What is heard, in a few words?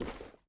ui pickup rubber; Rust